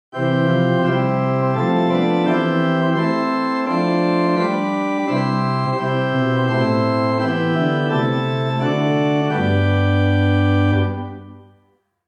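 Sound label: Great Open Diapason Large Principal 15th; Great: Open Diapason Large 8', Principal 4', Fifteenth 2'; St. Anne; Hauptwerk; St Annes Moseley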